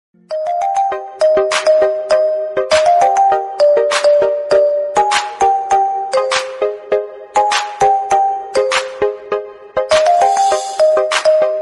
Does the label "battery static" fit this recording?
No